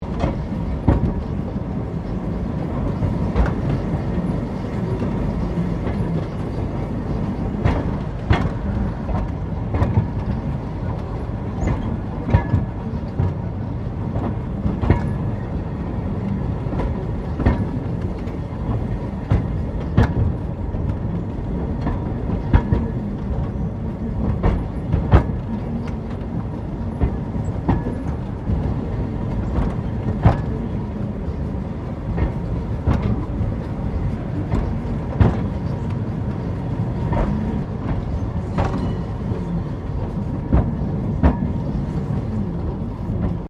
Tram Cable Portland 1800s Operating Slow Loop

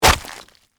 Splat Crunch Heavy; Texture; Splat Sound Effects; Splats (Sound Effects:Objects); BlastWave

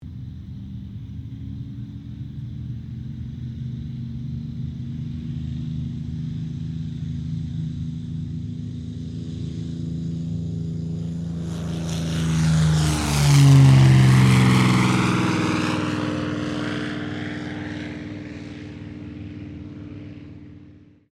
AIRCRAFT CAC CA-18 MUSTANG MK23 TAKEOFF; CA-18 /P-51 Mustang; Airplanes; Vehicles

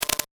A Series Of Clicks

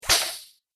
Robot Android Arms; Robot Sound Effects; Sci-Fi Robot Sfx; Andrew Fleming; Twistedtracks

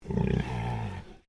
Animal Pig Landrace Inside Grunt; Edgar; Edgar's Mission Farm Victoria; Pigs; Animals

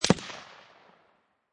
Rifle Remington 7Mm Single Shot Exterior At 75'

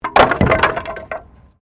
Wood Fall; Material components for constructing more complex sounds; Wood (Sound Effects:Materials)

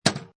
Pinball Sound Chunky Clank; Video Games

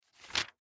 Book Softcover, Book Softcover Page Turn; Books (Sound Effects:Offices); Foley; Blastwave

